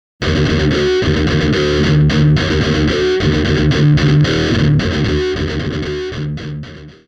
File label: metal